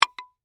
Tonfa Strike Impact, Warfare, Tonfa, Strike, Impact, Wood; Swords (Sound Effects:Combat); BlastWave